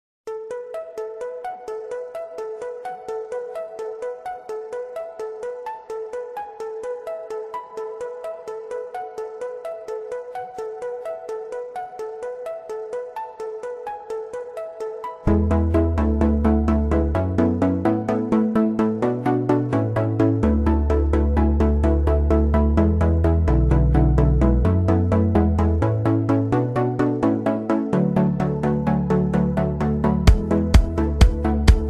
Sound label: Instrumental; Demo